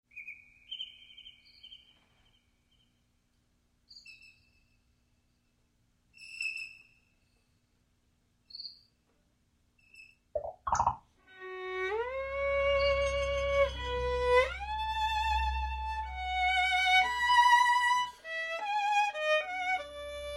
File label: Fugue